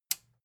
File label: metal button tiny push disable